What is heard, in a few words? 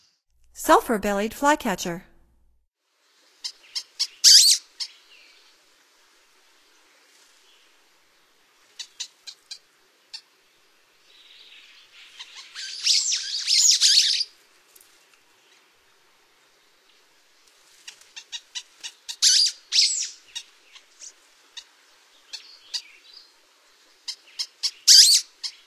Sulphur-bellied Flycatcher; North America; Stokes